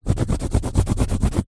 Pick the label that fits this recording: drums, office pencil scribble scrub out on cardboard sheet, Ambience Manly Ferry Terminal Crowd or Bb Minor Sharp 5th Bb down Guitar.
office pencil scribble scrub out on cardboard sheet